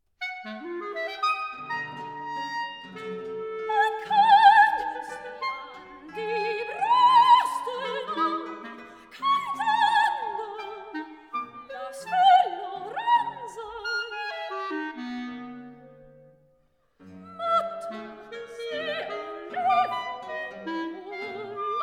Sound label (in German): Gesang Es Klarinette und Gitarre; Lieder fur Gesang Es Klarinette und Gitarre